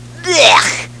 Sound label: blecch